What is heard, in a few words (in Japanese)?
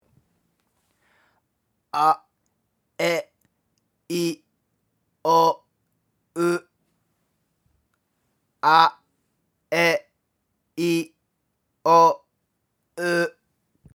母音きつめ